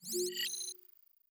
Level Complete; Ion Shift

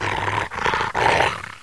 Skeleton Breathe

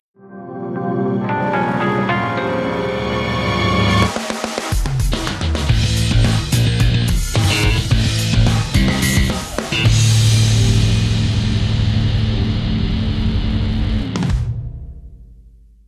Jingle Bad; Wolf of the Battlefield Commando 3; PS3

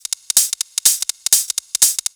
highhat 4beats

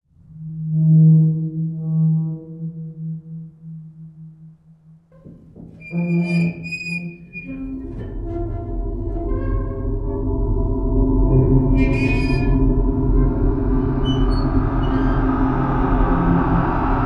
Tunnel Ambience; silent hill 3

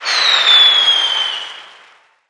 Music

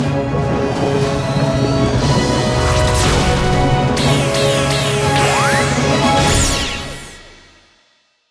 Level Up; TRANSCEND

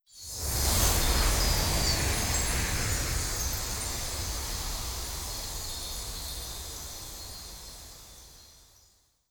Chimes; Legend of Keepers (gamerip) (2019)

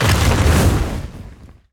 Finalboss Wavecast

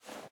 Sittable Sofa Stand

